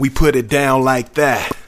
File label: Rap Vox